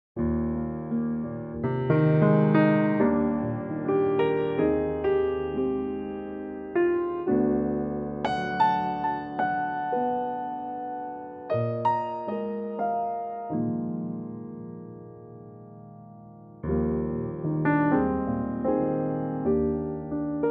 Eb Piano

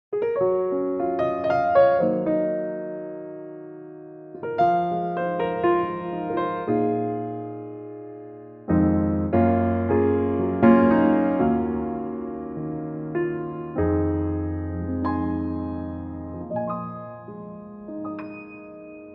Db piano; key - Db - vocal range - Ab to Ab (Bb falsetto)

